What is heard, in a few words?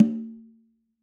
conga Single Hit Left Hand; Raw Perc One Shots; Slate; SD SEISMIC Drum Sample Pack